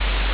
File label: ambience room tone